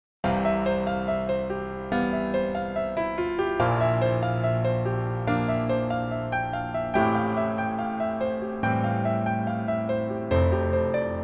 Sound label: Memory Vocal